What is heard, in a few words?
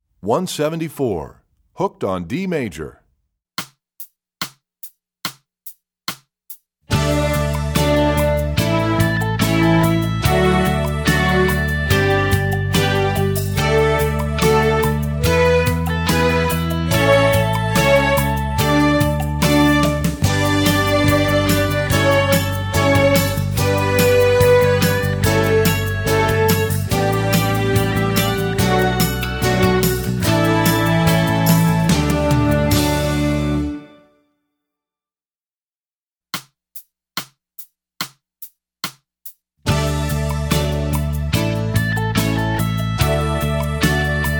D Major, Strings; Hooked On D Major; EE2000 Book1